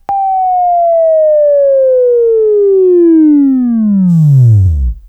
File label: Buzz; Labview-Program; Sweeping Training program